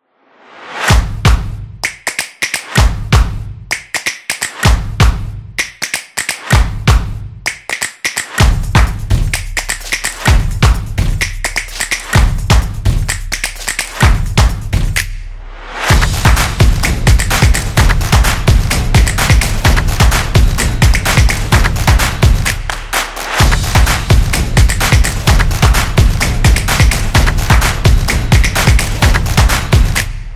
Rhythmic; football drums

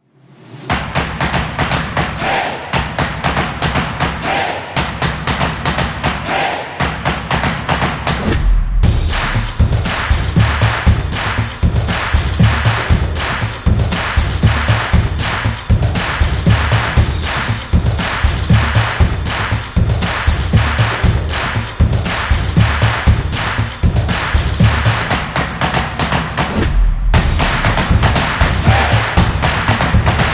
The Clap Action, percussive

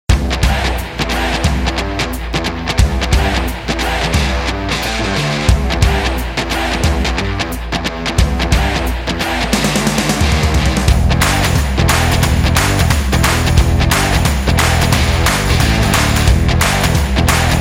Upbeat Indie Rock

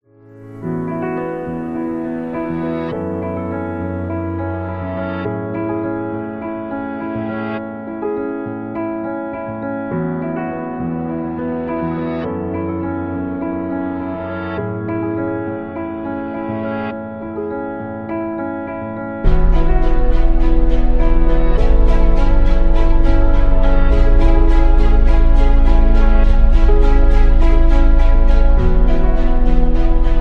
Piano Documentary; movie trailer

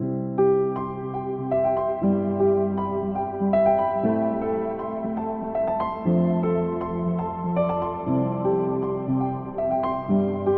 Upbeat Indie Folk; happy music vol 10

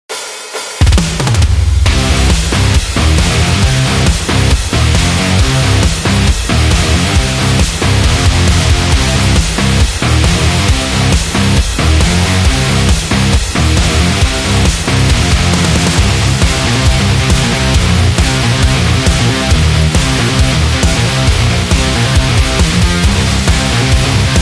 This Is Metal

